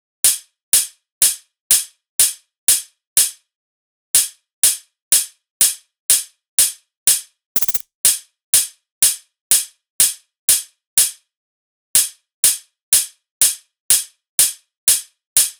loop hat 123; Seismic Loops

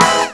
HIT ONE; E-MU Formula 4000 Series Vol. 2

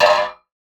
Percussive Hit, contact mic

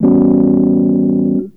Rhodes, Keyboards; Total Drum&Bass

